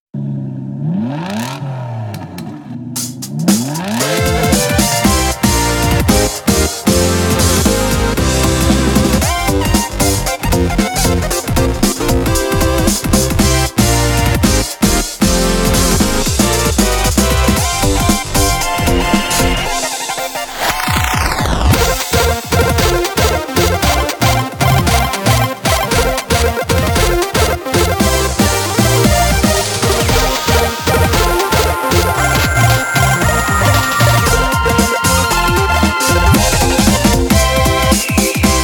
lofi; revision18

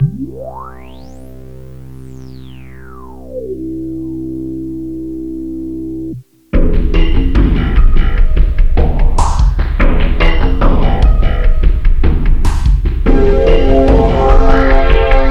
vhs song; simulaatio16

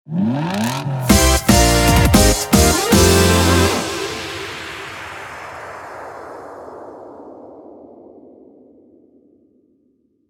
stinger; revision18

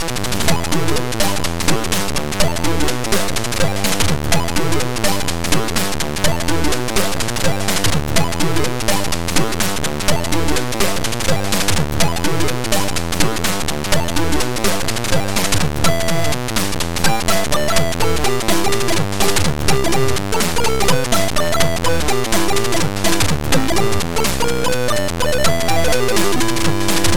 funky blues, zx; dihalt06

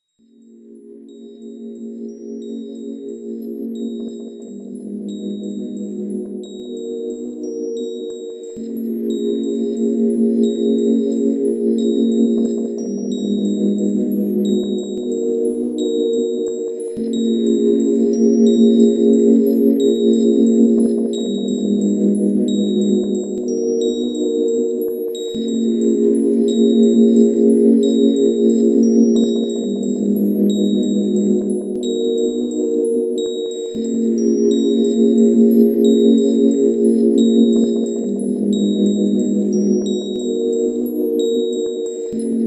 chamber ambience; enough records

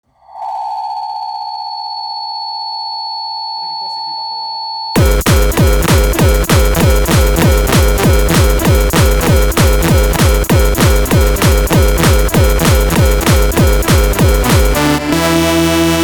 house music, gabba gabba remix, gabber music

